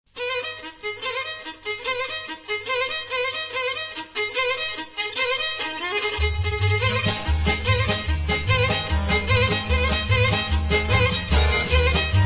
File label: Kolo Serbian